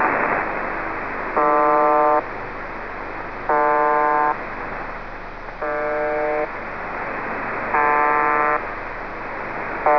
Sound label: buzzer